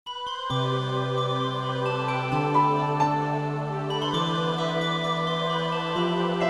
Dvd Sms

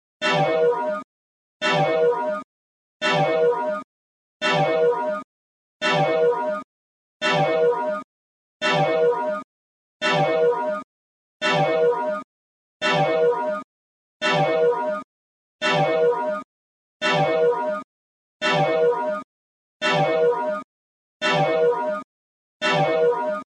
Alarm

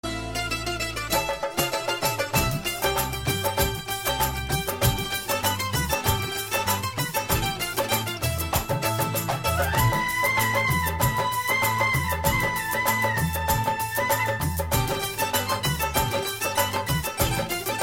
Instrument Music

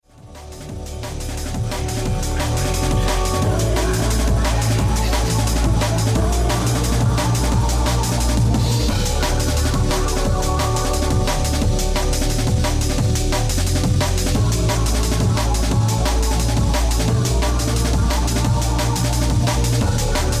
Drum And Bass

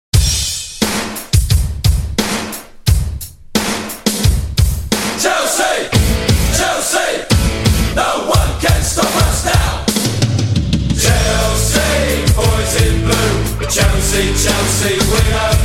Chelsea Song

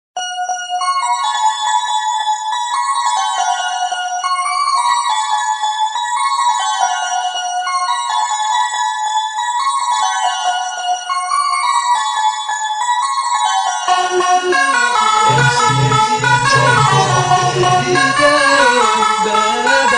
Turkish Rthm

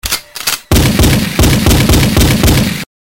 Gunshots

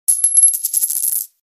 Coin Sms

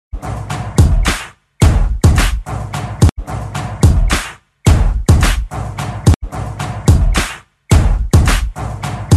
Singham Beats

